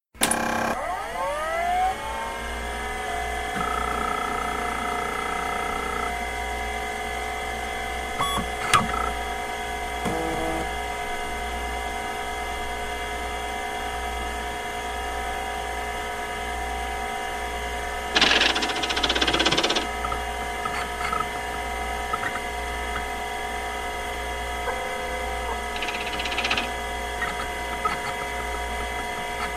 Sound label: computer